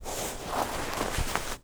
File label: MOVEMENT Snow; mono; UltimateFishing